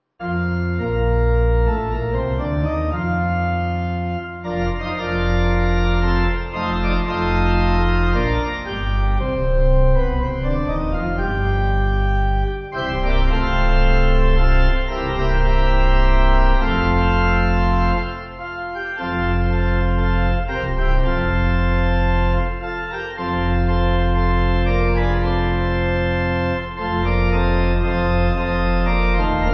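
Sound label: Pipe Organ, Music Backing Files for Churches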